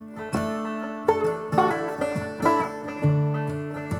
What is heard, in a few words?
banjo